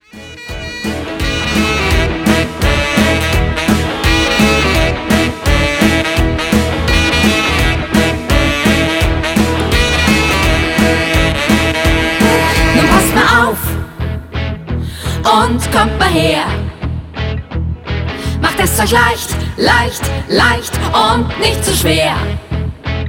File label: lied song